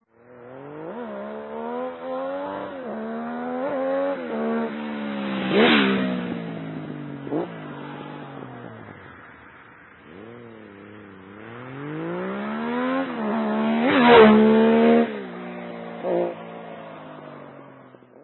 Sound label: trium 900 daytona; Triumph 900 daytona; GSX-R